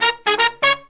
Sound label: Pop & Funk Brass